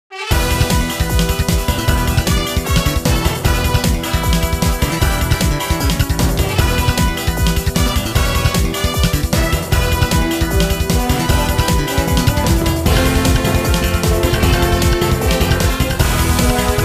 BGM STAGE; Pokemon Battle Trozei; Pokemon Link Battle; Nintendo 3DS

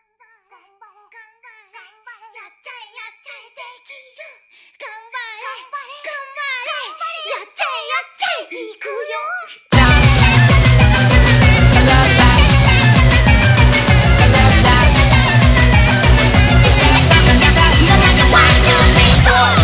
Trance dance; Muse Dash; Touhou Mugakudan IV; Android

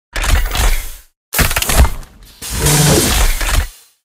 coal idle muzzle

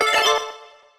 Enemy death; Popslinger

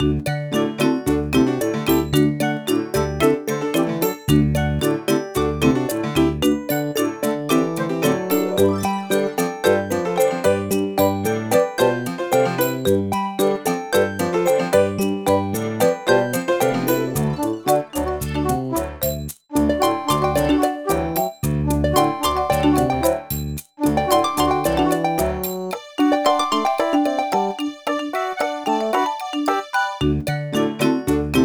Music Loop; Digging for Dinosaurs; NDS

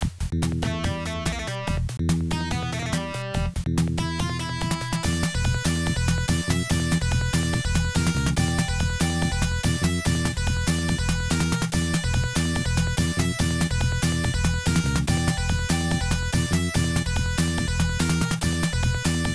Hurry Up!; Match 1 Player Heavy Damage; WWF WrestleMania: The Arcade Game; Genesis

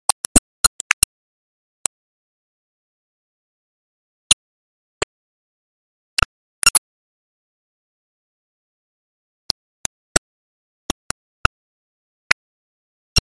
Fight Song

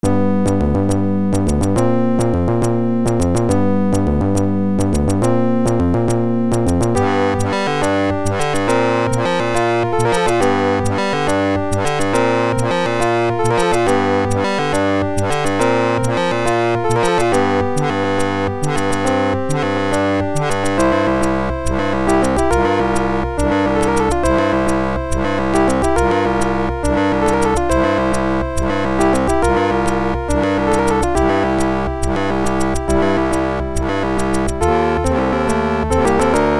Dark Forest; project toten eisenritter wolf 3d mod

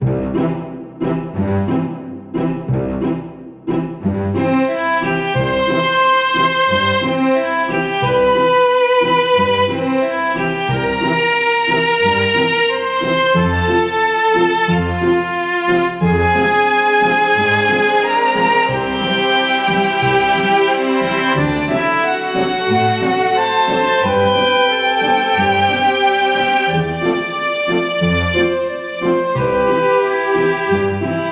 Waltz; time blazer switch gamerip 2022

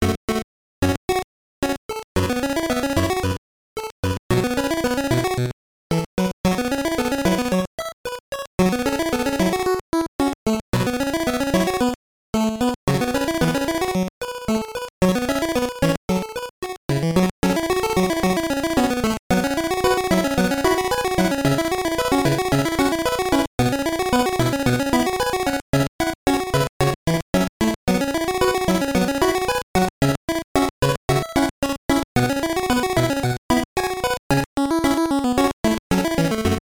Tavern; shui hu shen shou; gb

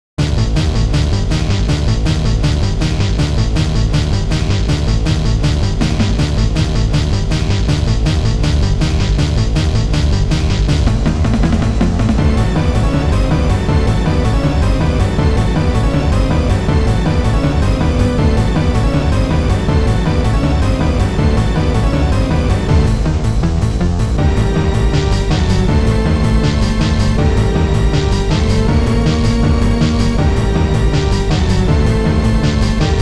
Battle Map , Forest; Langrisser Milennium

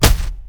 Target Mouth Gobble; the princess and the frog; Diner

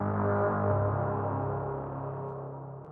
Castle Won; Castle Battles

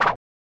Swipe; Bishoujo Senshi Sailor Moon; snes

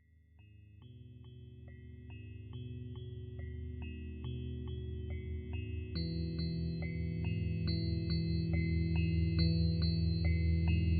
Music Exploration; Last Encounter

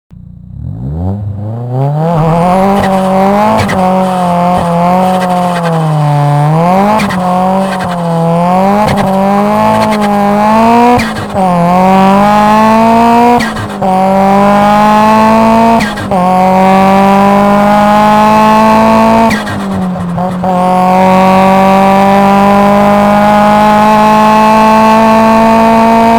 Exhaust Sound - S15; Kaido Battle; Vocal Exhaust Original Soundtrack

